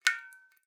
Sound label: Drips